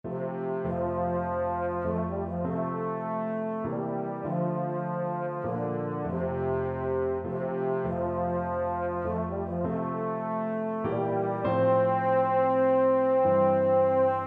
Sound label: amazing grace euphonium